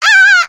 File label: pain severe; spyro v2